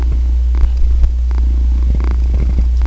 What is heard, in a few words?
purr